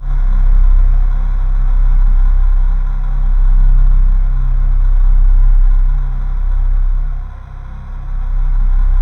C1, Synth Leads

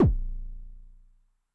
BD MOD [Pitch+Depth], 909mod, BASS DRUM

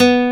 Nylon Guitar B2; Proteus 2000; Digital Sound Factory Proteus 2000

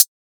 drum hat trappy; Virtual Riot; Drum Hits

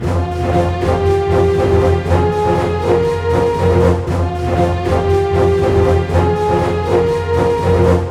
120 READY, Scoring Tools ORC Ready Fight; East West

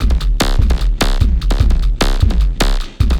Beat, Futurist Drum & Bass; Drums & Percussion

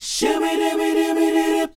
SHOO B F#, R&B Dance, vocal; Spectrasonics Vocal Planet

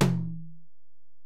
Tom, Alesis DM-PRO, Drum Machines, Toms

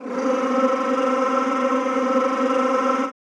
CHO Rrrr

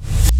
KICK REVS

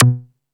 House Machine, Drum Machines

